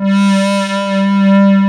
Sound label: DIG PPG G4, MICROWVE; Northstar - New Gold VOL-1